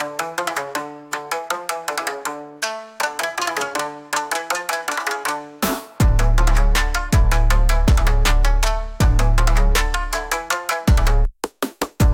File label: Trap Bass, Japanese; Kyoto